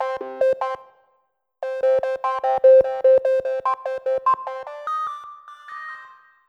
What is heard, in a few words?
R&B Lead Cm; R&B Funk Soul; Digidesign; Pro Tools; Pro Tools Essential Demos; Essential Loop Demos; Demo Session